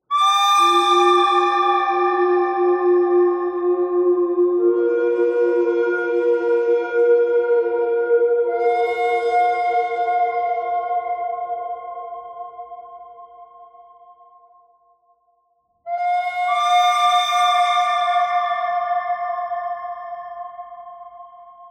bird thread pentatonic

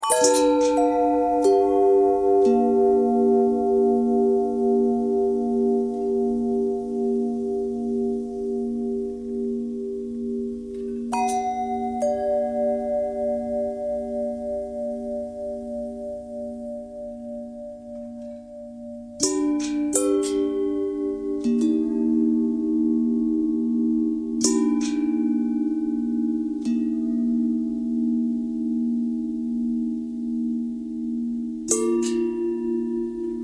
long bell thread noise, bells

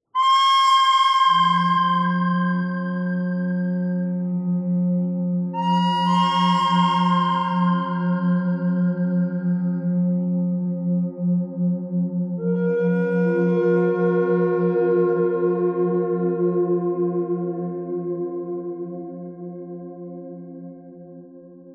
bird thread pentatonic; noreverb

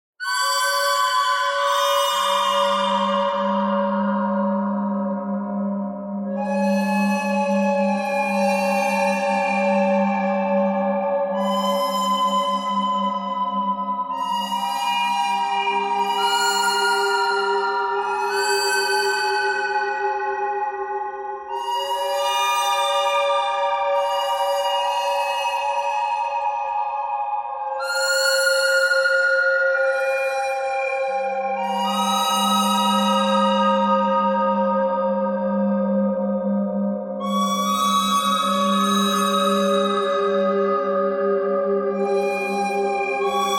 bird thread harmonic

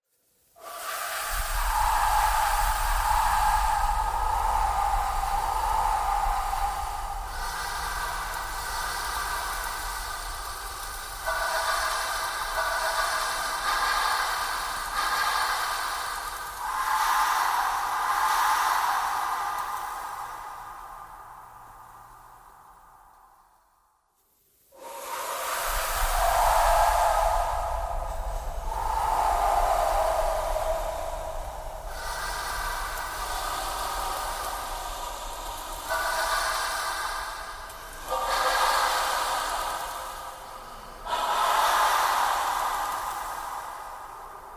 breathing thread noise

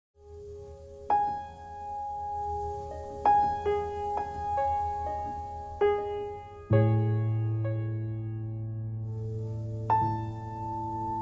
piano noise

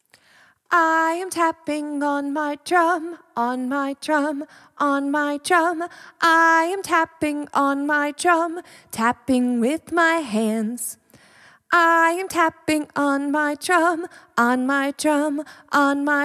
tapping with my hands

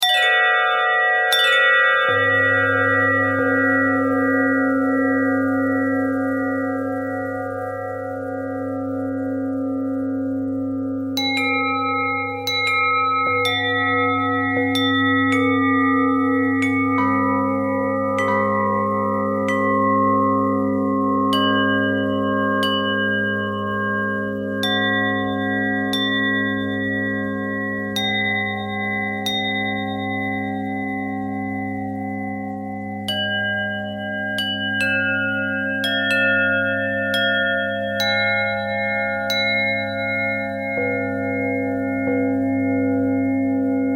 bell harmonic twist

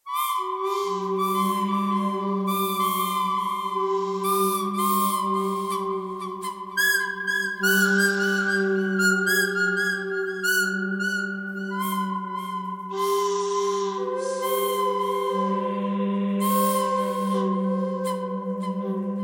bird canyon

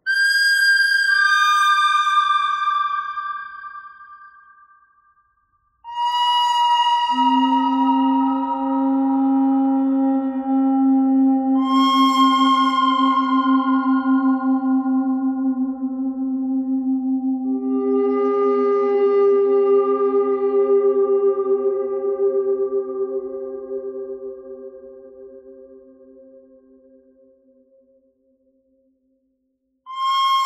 bird thread harmonic; birds

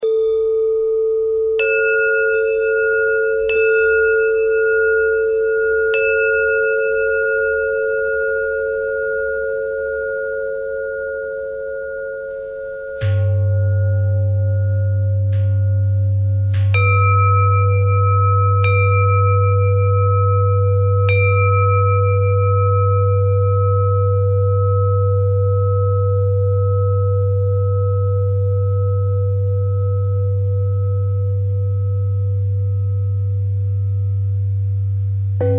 bell threads

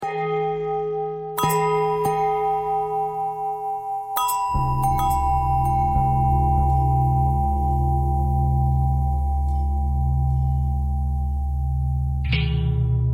long bell bell thread simple, bells

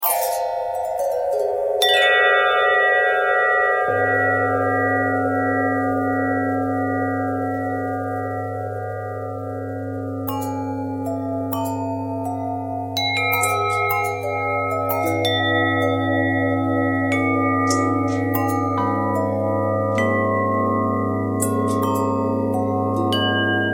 bell long bell twist, bells

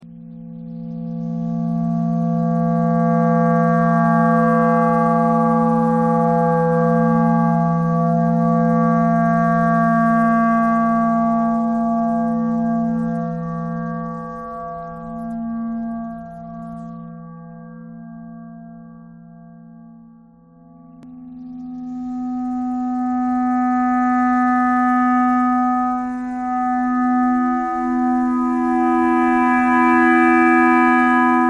clarinet clarinet thread noise

